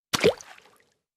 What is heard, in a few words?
splash; Splatoon